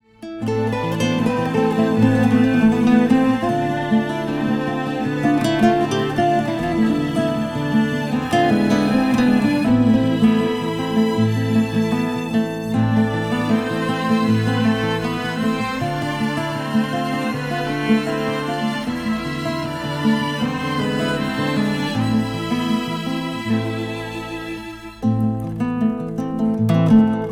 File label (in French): Grenier denfance guitare